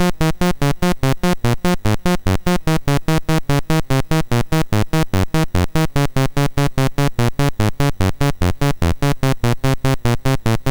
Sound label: sid MOS6581R2; Lernaia BASIC